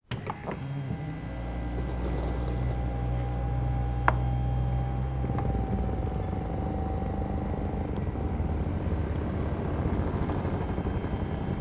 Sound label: chair electric recliner leather operating recorded underneath